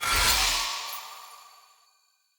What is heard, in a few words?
Long Sword Recall Button Push; Weapons; Player; Pothia Long Sword; Swords